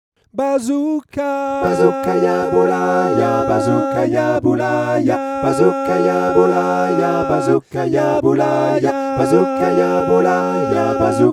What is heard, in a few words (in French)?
4 voix